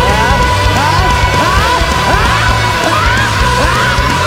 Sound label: ahhh; garrysmod